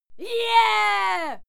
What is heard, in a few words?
female cheer yeah; schreie; menschen